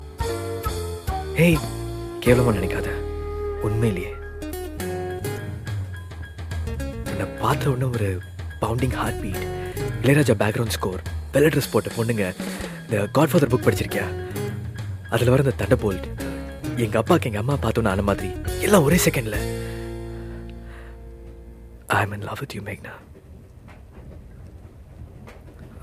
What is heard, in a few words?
Heart Beat